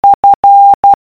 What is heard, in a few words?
F morse code